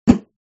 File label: tile swap; gardenbloom